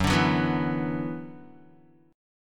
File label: F sharp 7th Suspended 4th Sharp 5th, Guitar